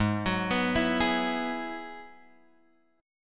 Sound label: Ab Minor 7th Sharp 5th Ab, Guitar Drop D; 6,9,9,9,9,x; down